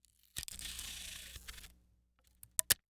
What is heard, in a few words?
Industry Tape Pull Off Roll And Cut Scotch